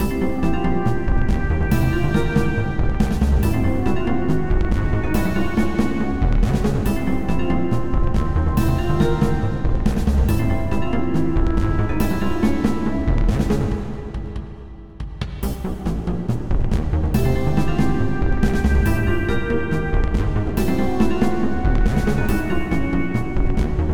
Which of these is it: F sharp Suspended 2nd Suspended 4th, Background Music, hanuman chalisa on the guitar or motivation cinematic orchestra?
Background Music